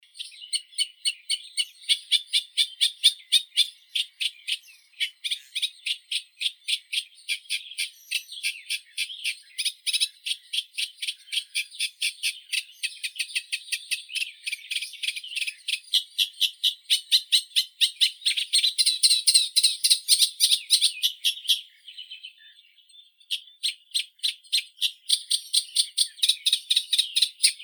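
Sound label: reed warbler, acrocephalus scirpaceus; kamishovka trostnikovaya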